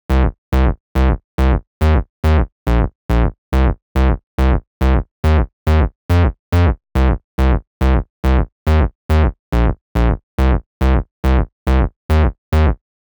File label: 140BPM C; Vengeance - Essential Clubsounds Vol.4